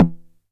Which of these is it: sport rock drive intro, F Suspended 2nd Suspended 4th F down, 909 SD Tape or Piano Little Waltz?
909 SD Tape